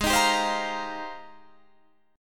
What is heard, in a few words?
G sharp Major 13th, Guitar, DADGAD